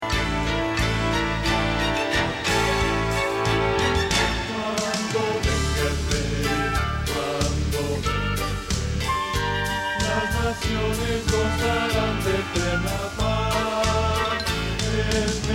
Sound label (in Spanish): Bajo